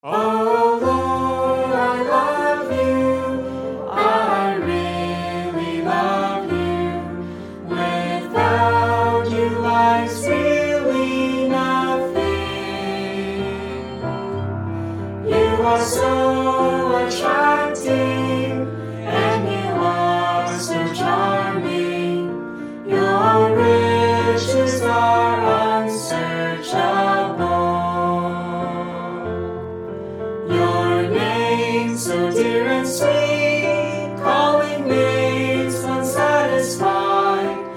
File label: sing, hymn; New Songs